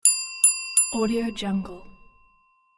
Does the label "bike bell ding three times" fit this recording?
Yes